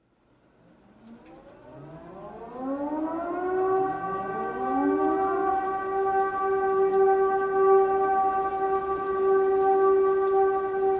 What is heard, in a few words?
paris siren